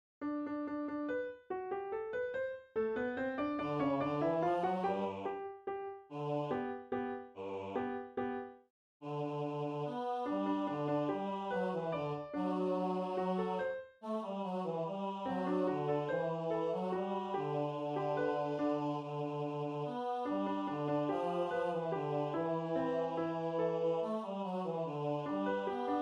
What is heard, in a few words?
Mass Choir Ten Bass; Tinikling